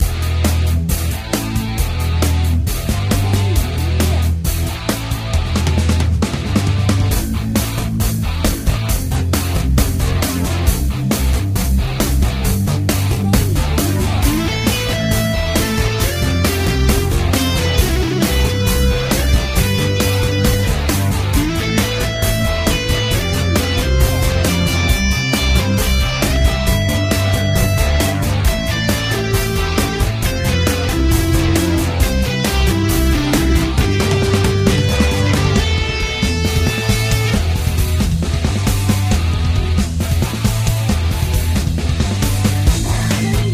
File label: Game bgm